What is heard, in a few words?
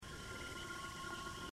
wisp sound; risk of rain 2